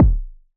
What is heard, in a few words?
KCK, Roland SH-09 synth drums